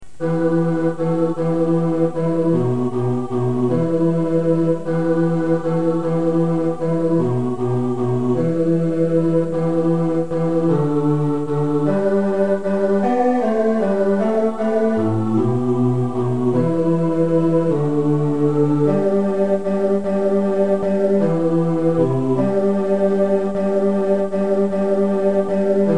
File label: we three kings bass; Christmas; carol tracks